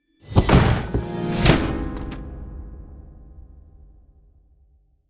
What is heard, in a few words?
box not prize; World of Tanks Blitz